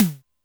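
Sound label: Snare Hi Electric, Alesis D4 drum machine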